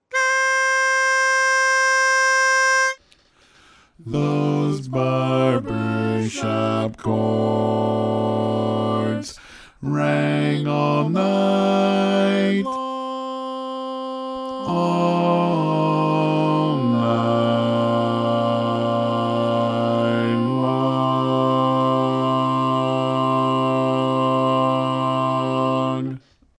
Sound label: Those Barbershop Chords, Bass